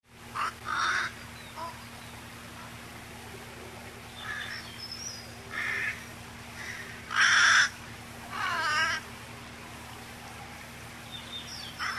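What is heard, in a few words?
Corvus corone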